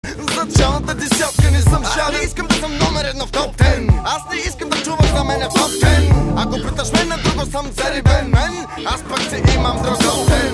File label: win, music